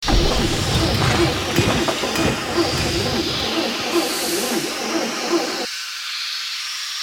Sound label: Amb